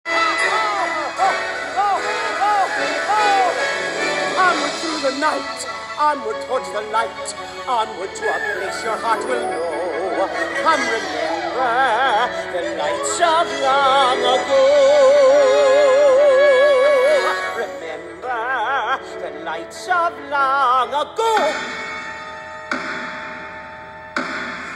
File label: with vocals